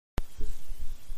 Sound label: zipper open; Foley